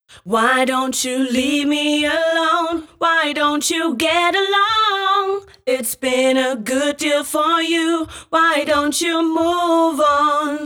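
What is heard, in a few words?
Me Double, female vocal; женский вокал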